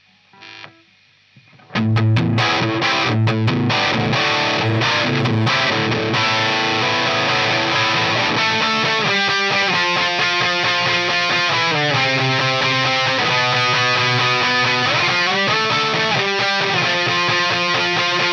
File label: Gtr